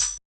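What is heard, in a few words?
Hi-Hat Open; Yamaha DJX-2B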